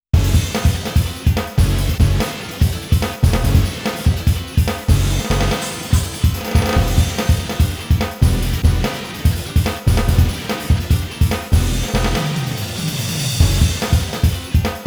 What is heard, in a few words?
Goal Replay